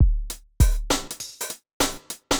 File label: drloop, drumloops, Vinyl Attack; Masterbits